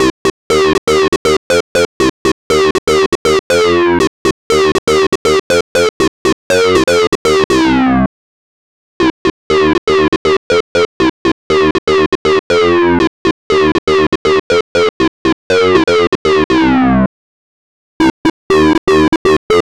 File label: Jupiter 8 Car Sync